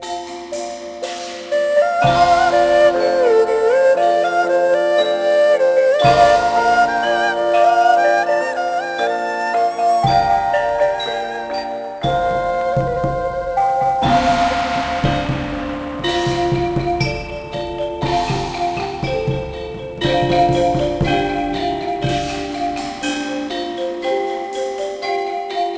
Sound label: MUSIC LEVEL